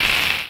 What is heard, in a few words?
Ranged Magical Light; Kilta